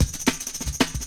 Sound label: UP TEMPO